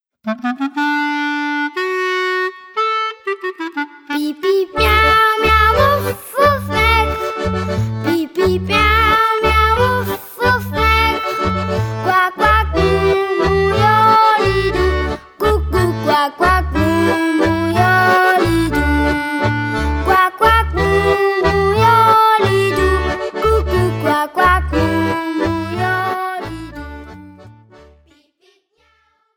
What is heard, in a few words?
Laendler